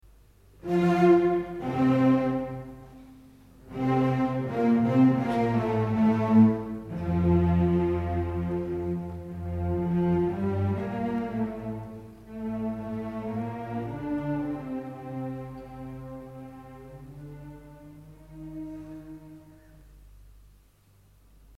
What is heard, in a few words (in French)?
recitatif vc cb; quatrième récitatif; Beethoven